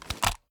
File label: reload xmag fast end; gmod; iw8; uzulu